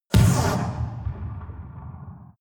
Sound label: rpg shot distant